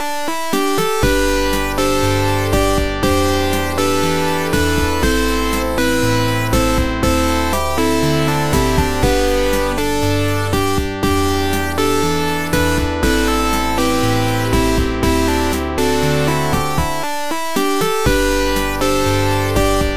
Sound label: Tense Song; Kens Labyrinth